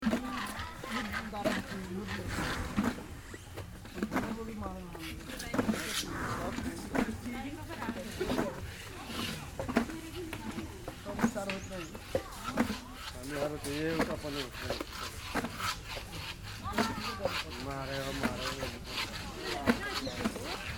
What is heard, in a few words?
elephants scratching, nepal